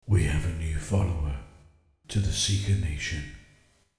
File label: follow alert